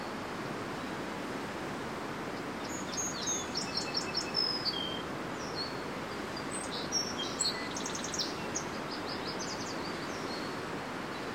Song Sparrows yellow Warblers And red winged Blackbirds; Conversation Between Two Song Sparrows